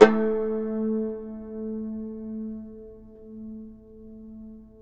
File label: CUMBUS, Guitars; Spectrasonics; Hans Zimmer Guitars VOL-2